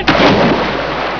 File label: splash